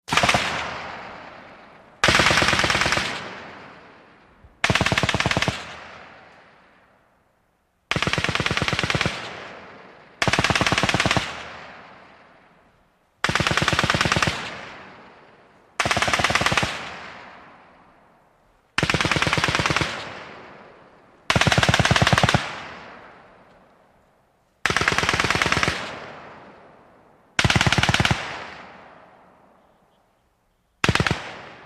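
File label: Machine Gun Squad Automatic Burst, exterior; squad automatic weapon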